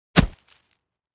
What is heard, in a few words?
Foliage Impact Object Dropped on Ground; hits & impacts